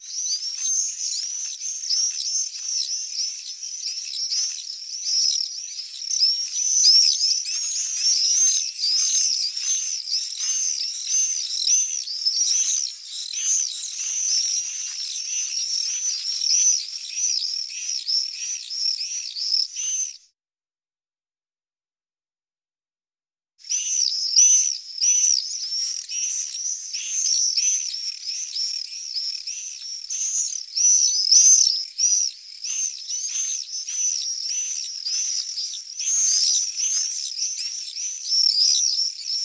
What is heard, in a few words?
apus apus; ansiedeln; nistkasten; klangattrappen